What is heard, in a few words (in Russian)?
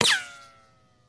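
свист вылетевшей пули из игрушечного пистолета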